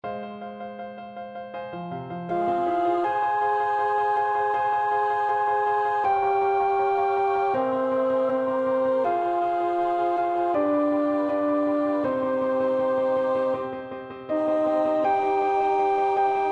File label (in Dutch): alt 2, koorpartij oefening; Elgar (Edward); Scenes of the saga of King Olaf